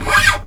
NYL SCRAPE, GUITARS; Synclavier Sampler Library

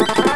Explosion; Revolution X

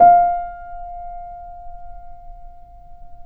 PP F 0, Grand Piano off mic; AKAI S6000 CD-ROM - Volume 2

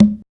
African Perc

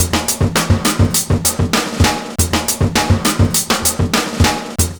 NO RIDE, LOOP; Univers Sons - Kitch 70s

